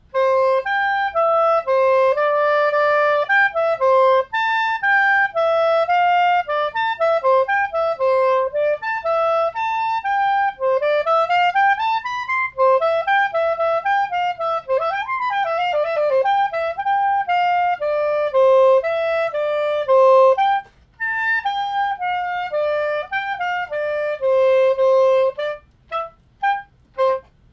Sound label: melody